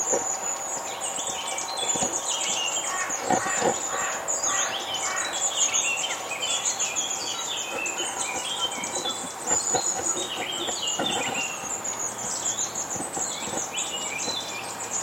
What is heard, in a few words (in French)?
mesanges longue queue